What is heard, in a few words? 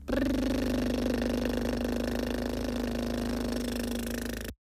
cweam engine